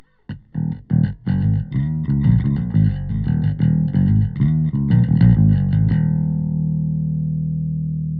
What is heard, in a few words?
808 Bass; SubBass Doctor; CURE 100